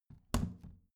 plastic trash can close regular muted

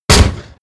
shotgun close shot

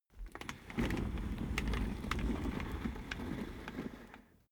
office chair worn trundle long

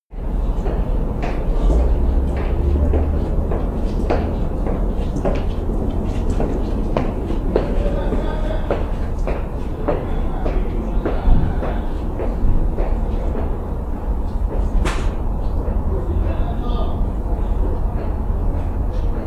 bus station

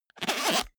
zipper small case open fast

